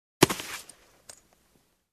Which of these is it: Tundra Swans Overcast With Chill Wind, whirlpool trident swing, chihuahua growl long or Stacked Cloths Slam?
Stacked Cloths Slam